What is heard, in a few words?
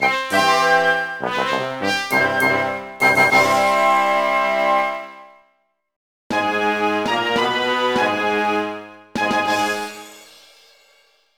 Boss Clear; mario vs. donkey kong mini land mayhem; Super Skywheel